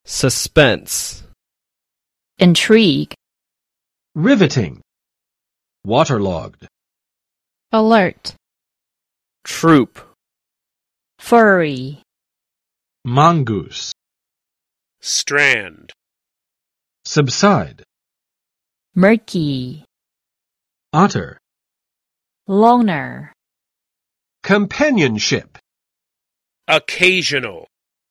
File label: suspense; survival of the fittest on jao secret creatures of jao